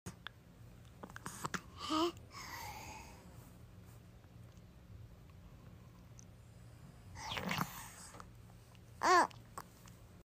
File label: Baby yawning